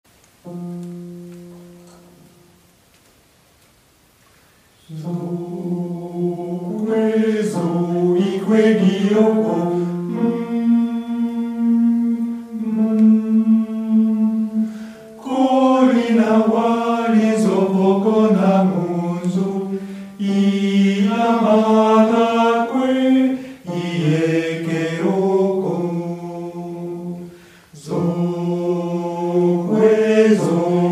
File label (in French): Zo kwe zo Hommes